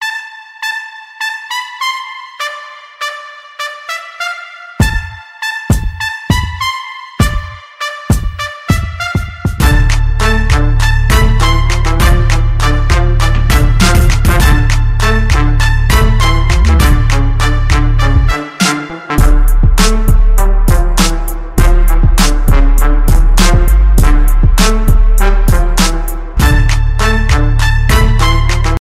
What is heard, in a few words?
March; Brokentooth